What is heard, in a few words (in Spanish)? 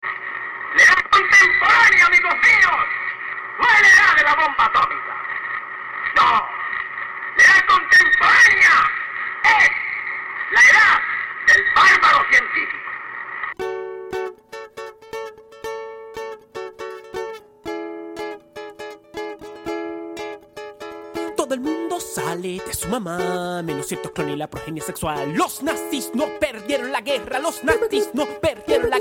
gracioso tono de llamada